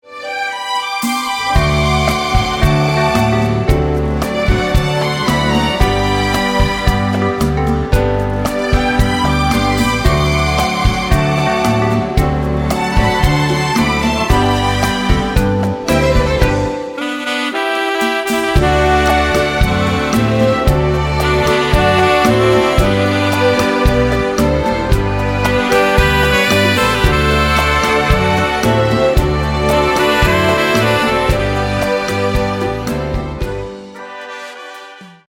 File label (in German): Die Gitarre